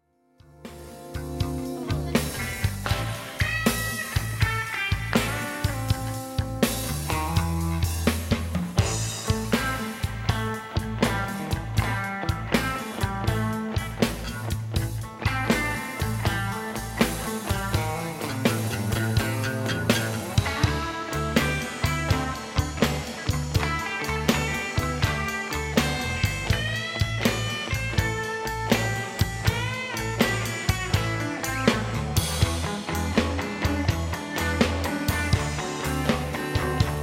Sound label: TRAIN STATION BLUES